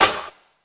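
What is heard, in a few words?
snare drum, Drum & Bass, 168 BPM; Ueberschall; Drum & Bass Resonance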